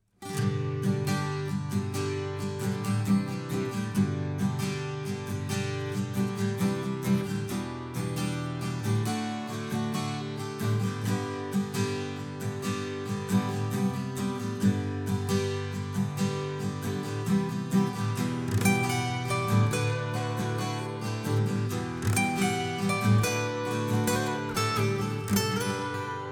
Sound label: Instrumental Acoustic Guitars; M-TRACK DUO HD; producer pack